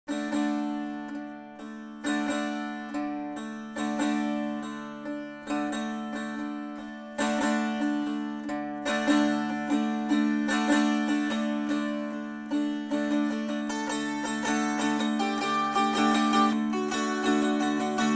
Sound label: dulcimer